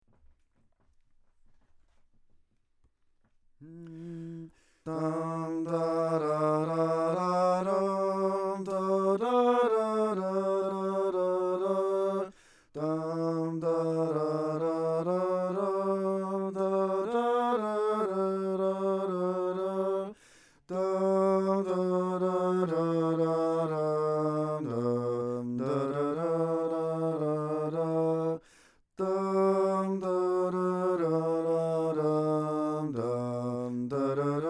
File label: pavane sopran